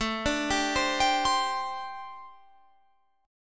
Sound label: C Suspended 2nd A; C2/A; arpeggiated